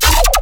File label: glitch stab; Virtual Riot; Glitch Stabs